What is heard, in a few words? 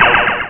echo